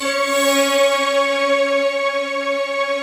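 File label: Chinese orchestra; Optical Media International; Sonic Images Library; Sweep Combi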